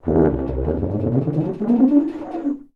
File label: TUBA WILD